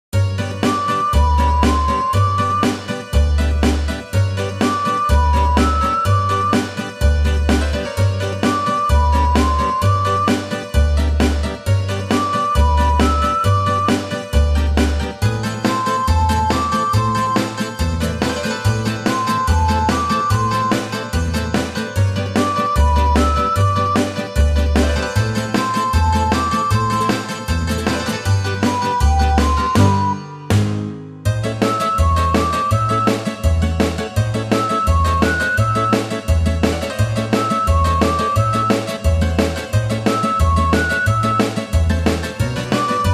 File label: sirtaki; Ethnic